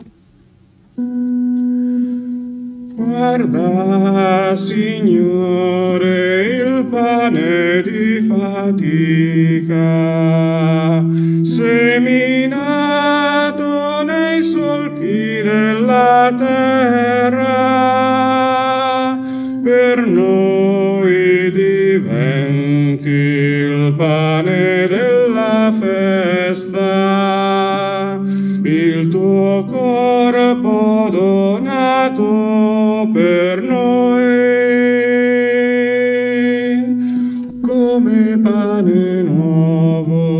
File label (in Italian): Come Pane Nuovo Uomini